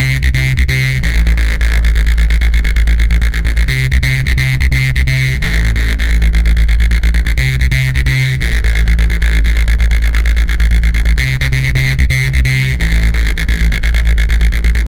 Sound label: agressive neuro bass